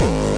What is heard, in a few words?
obnoxious kick; Kicks; single hits